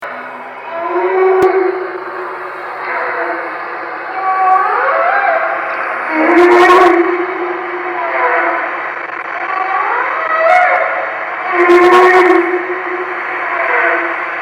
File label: Singing Whales